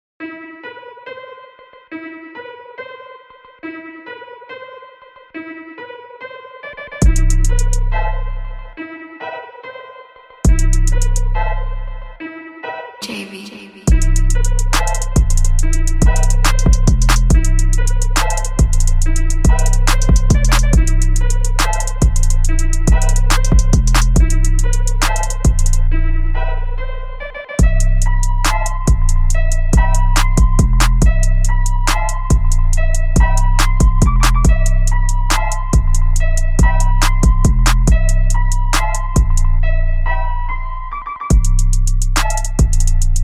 Trap Type Beat

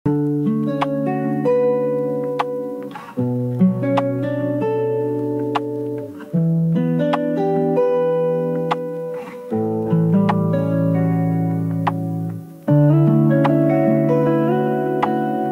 Lofi Chill

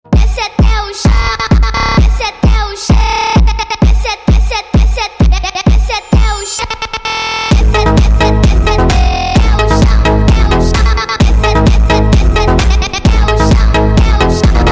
FUNK, PHONK; bayerische motoren werke BMW

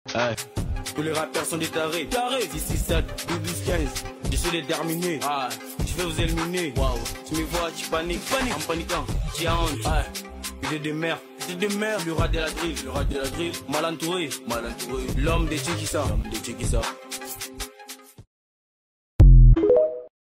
drill rap

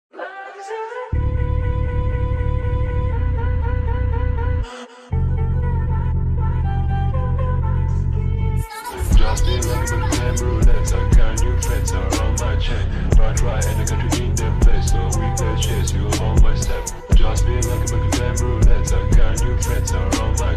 goth vibes dark aesthetic ep song

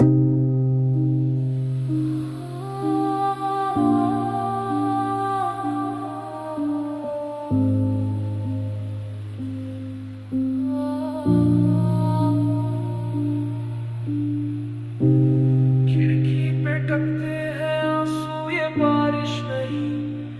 Marathi Emotional Rap

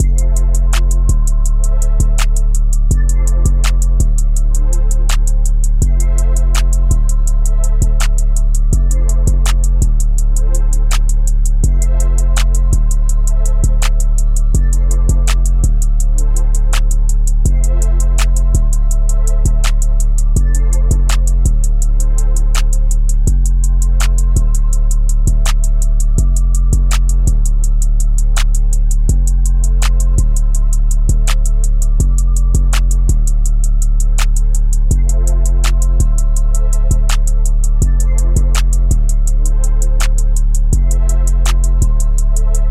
Instrumental

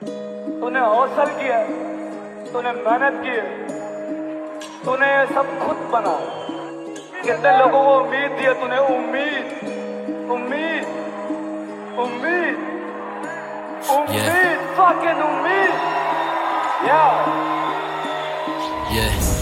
Soundscape Sad

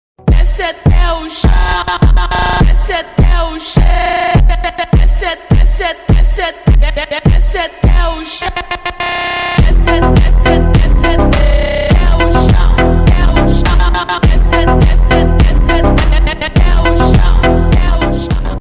Phonk

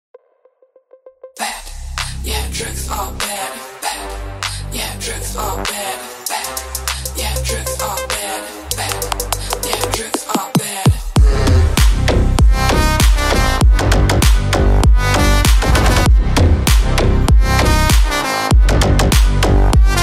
Slap House

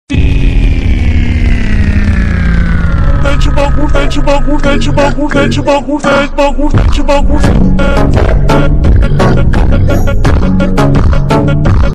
FUNK bassbosted

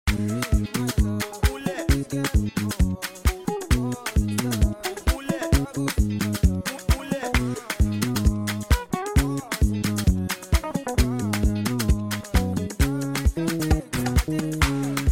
With You Guitar

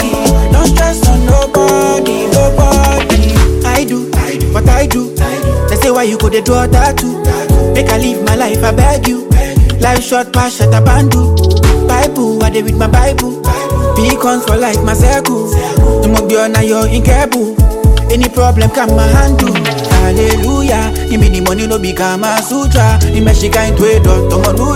amapiano afrodancehall

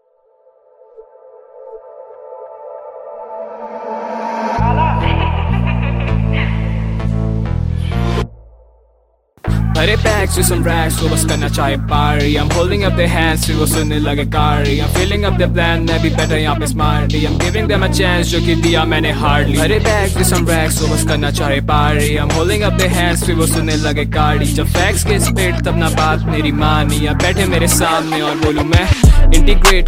TYPE BEAT

